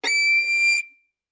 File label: Violin arco ff sul E D7; MIS Pitches - 2014; Strings